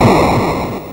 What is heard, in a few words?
Atari Noise Long, Atari 2600